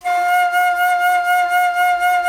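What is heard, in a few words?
flute A; Miroslav Vitous - Solo Instruments VOL-2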